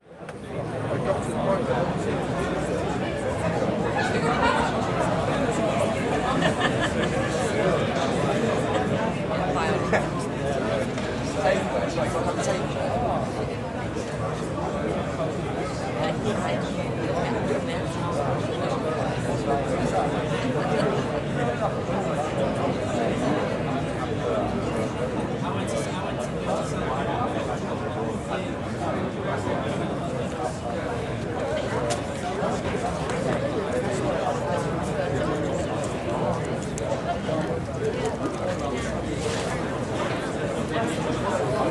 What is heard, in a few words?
ext manchester pub beer garden crowd british accent uk big city exterior; sonniss gdc